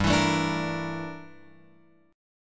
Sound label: G Minor 6th Add 9th G down Guitar Open F; Gm6add9 strummed; JGuitar